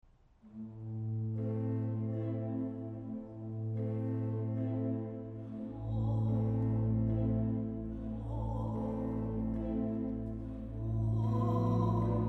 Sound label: Elegie, ORGUE